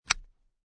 Car Interior Seatbelt Fasten, Car Interior, 1996 Acura Integra, Seatbelt Fasten, No Engine; Transportation Automobiles